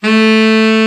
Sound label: BRA TENOR, SAX; Best Service Gigapack 1; BR SAX 2